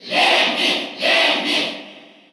Cheer Russian; SSBU

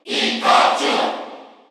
Cheer Korean; Pikachu; SSBU

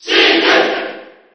Cheer JP; Sheik; SSB4

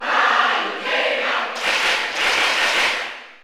Cheer German; Palutena; SSBU

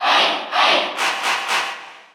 Cheer Russian; Ike; SSBU

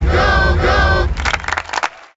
Cheer German & French; Jigglypuff; SSBM

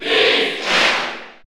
Cheer French; Pit; SSBU

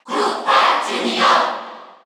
Cheer Korean; Bowser Jr.; SSBU

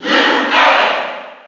Cheer NTSC; Lucario; SSB4

